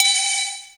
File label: AGOGO FX LOW, Drum Machines, Akai MPC-3000